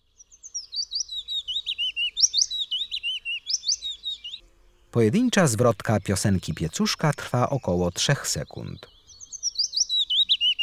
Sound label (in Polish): Piecuszek, Ptaki; Kurs PTTK